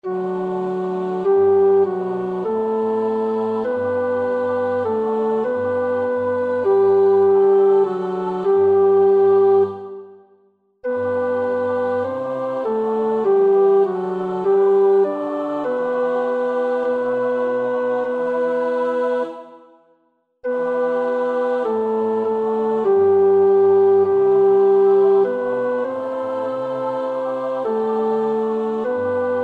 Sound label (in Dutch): tenor; Nieuw Liedboek; NLB 85